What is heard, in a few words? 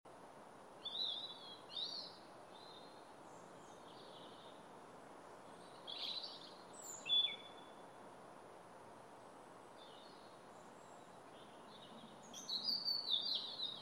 early morning birdsong